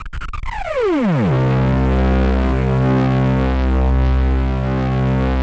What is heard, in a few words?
SPACE STRINGS, Yamaha VP-1; Music Store